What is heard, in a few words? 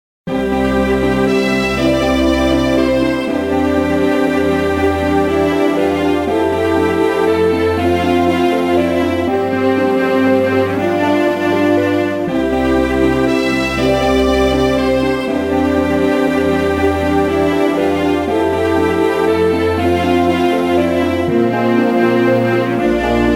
piano; minecraft; Pokecops